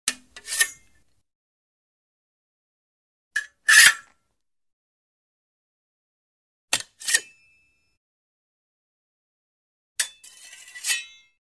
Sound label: Rapier Draw From Sheath With Light Scrape & Metal Sh; Hollywood Edge Foley Sound Library